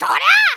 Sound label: Spin Attack; Link's spin-attack shouts